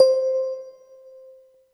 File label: Kawai K5000W Music Box C5